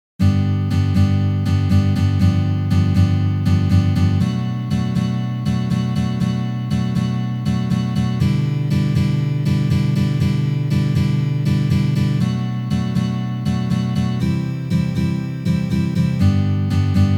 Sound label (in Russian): Uprazhneniya akkord G7